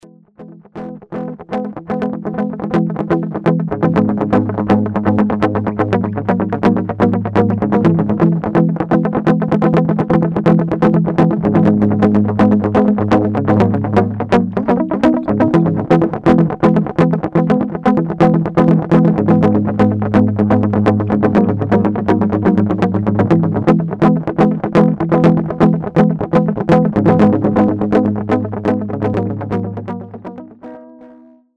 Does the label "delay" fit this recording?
Yes